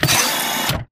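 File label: Stalker Movement Servo Head pivot Medium; Titanfall 2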